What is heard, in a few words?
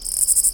WALK